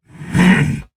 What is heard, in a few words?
Attack Effort; Warframe; Dax Heavy; Battle Barks